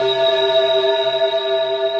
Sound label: Lead, Synthesizer Middle C Notes